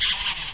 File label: shock idle; shockroach